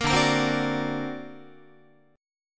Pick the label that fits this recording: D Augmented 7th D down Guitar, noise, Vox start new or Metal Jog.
D Augmented 7th D down Guitar